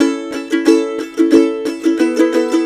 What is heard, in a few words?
COUNTRY